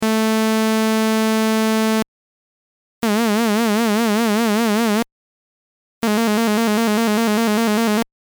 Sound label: Delay Modulation